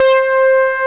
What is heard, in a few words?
tranz syn; The Epic Collection 3; Epic Marketing; muzak samples